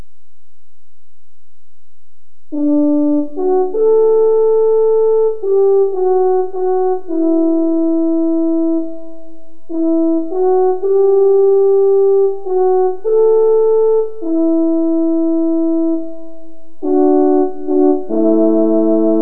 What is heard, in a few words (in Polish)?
hejnal